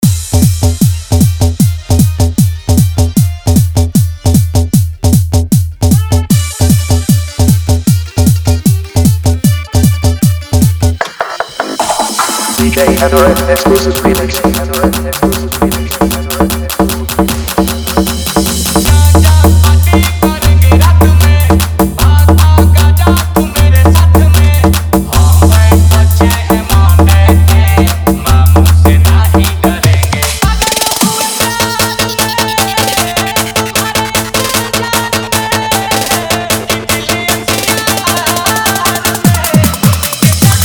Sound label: Bhojpuri Dj Song Fully Dhollki